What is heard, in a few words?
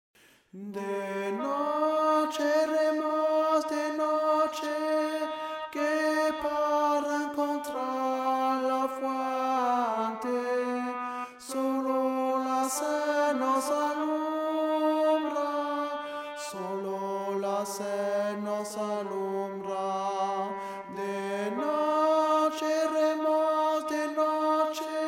De noche ALTO